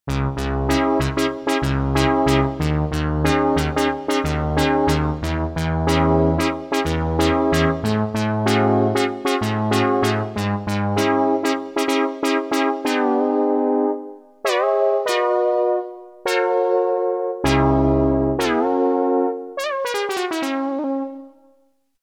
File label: Synth Brass; Guess the synthesizer game